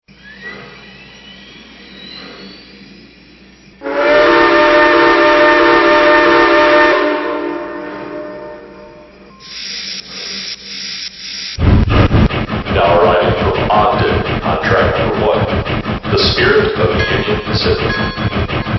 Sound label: 484 FEF, trains